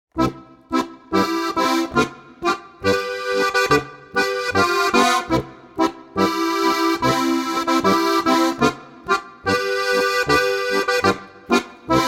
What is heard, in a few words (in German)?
Polka